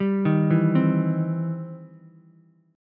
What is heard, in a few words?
E Minor Major 7th Sharp 5th, E, down, Ukulele; EmM7#5 arpeggiated